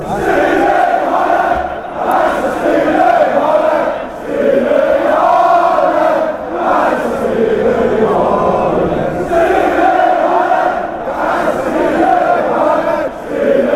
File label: chant, denmark fanchants; fc copenhagen